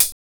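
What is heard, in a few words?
hi-hat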